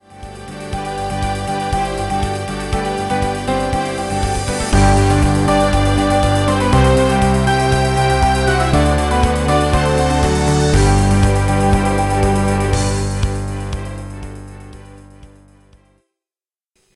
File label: Jingle Long; Wikinews